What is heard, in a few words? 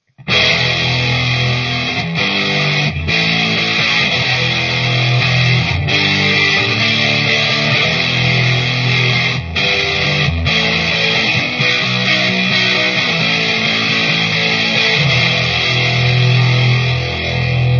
effects